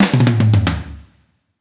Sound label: fill, fills